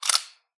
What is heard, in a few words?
coins handling